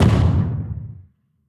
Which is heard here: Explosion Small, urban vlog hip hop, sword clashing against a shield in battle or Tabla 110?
Explosion Small